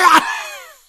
smoker pain short; voice

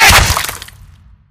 bullet impact flesh; physics

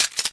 grenade launcher drum start, weapons; tf

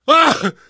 hurt major, survivor voice; source engine; left 4 dead 2; namvet

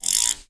ui scrape; Source Engine; Portal 2

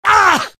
pain sharp; demoman; source engine; tf

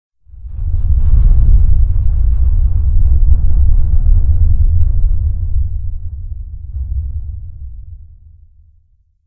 random rhumble

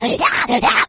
rage at victim, infected female

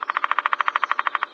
peckr, forest; ambient; source engine; ep2

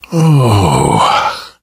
pain relief sigh

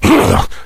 rage at victim, infected

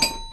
training point big

